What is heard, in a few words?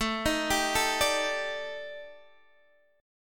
Eb Major 7th Flat 5th A, guitar